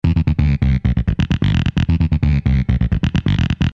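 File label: Bass Line, Loops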